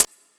clean pedal hi hat sample B key, hi-hat sound tuned to the B note